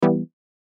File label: Reached Menu Top